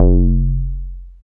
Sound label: Bass SH-101